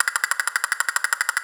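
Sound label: Knocking Knees, Classic SFX, cartoon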